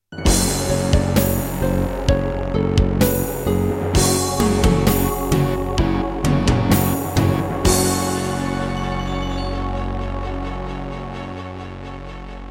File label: Boss Dr. Synth DS-330